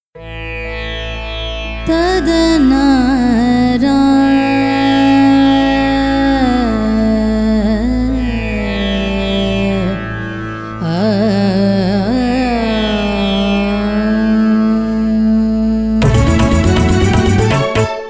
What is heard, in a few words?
Jingle